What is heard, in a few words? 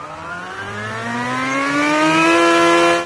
tram motor start; Garry's Mod; hl2